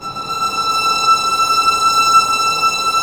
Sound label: STR VLN SD, Vlns Bow FX, Sord; Roland - String Master Series